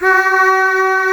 VOICES C4, SYNTH VOX; East West - Global Sounds

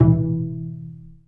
Bass Piz G E2; Digital Sound Factory Virtuoso

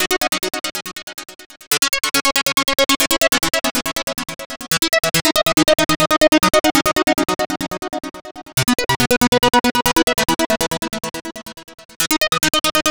NRG Arp, trance

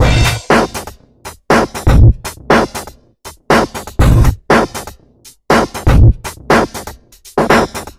groove hip 120bpm, Downbeat Dance Grooves

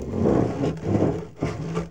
BOX SLIDE; Ambient Sounds; Box Noises; E-MU Producer Series Vol. 3; Hollywood Sound Effects

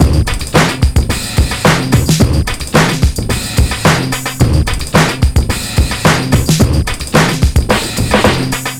HIT THE BREAK, 109 bpm; POTBreaks

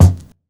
KIT, Dance Mega Drums, HIP; Best Service

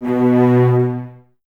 Cellos B 2, String Ensembles; Miroslav Vitous; LINKS ORCH

